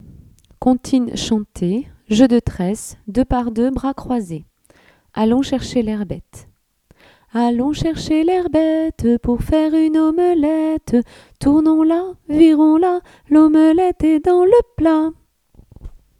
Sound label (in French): Allons Chercher L herbette Comptines Chantees Jeux De Tresse